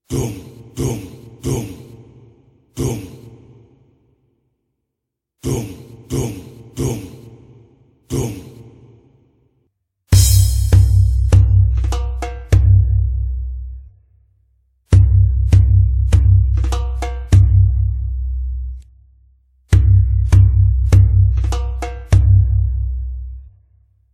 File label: masmoodi kebeer, rhythm; rhythm CD for teaching